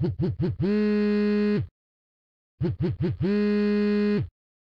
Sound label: phone vib symphony; devices accessories utilities; NS Studios - ultra sound collection